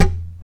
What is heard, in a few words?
TAP, FLAMENCO Guitars